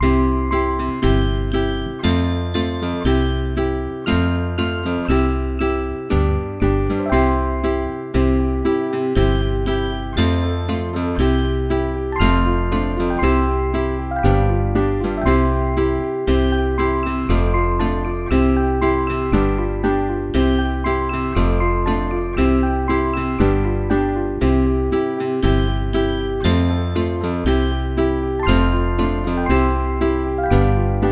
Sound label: nursery rhyme alphabet song instrumental